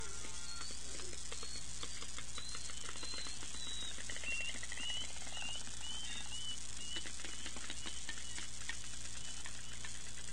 whistles, SRKW

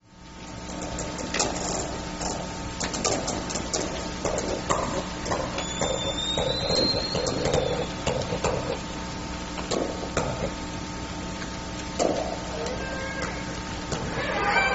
biggs transients, biophony; dabob

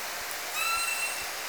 call, Orca; SRKW; Alwin

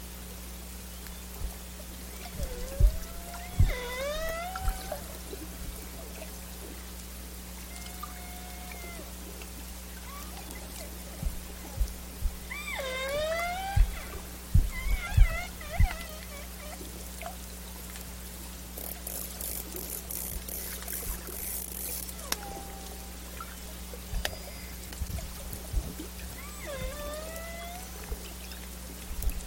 Biggs, biophony

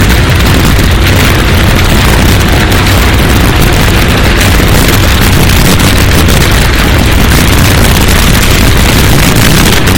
container ships, vessels, anthrophony; montecito cpa saturated

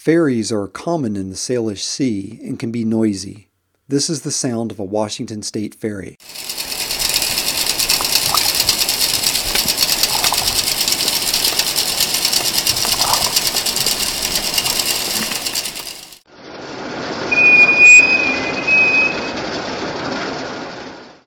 ferry narrated

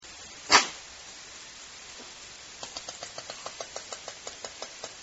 percussive snap, orca

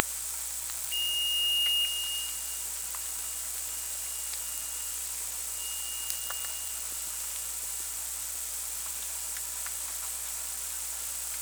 sonar

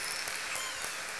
call S5 (K pod), OrcaCalls, SRKW; Alwin

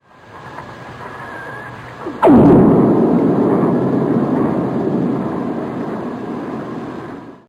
lightning, Salish Sea sounds

